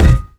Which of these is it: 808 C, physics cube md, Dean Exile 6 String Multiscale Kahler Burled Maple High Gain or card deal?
physics cube md